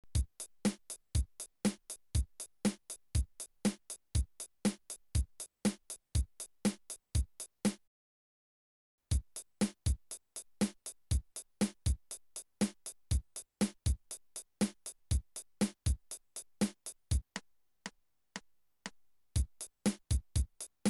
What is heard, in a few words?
8 notes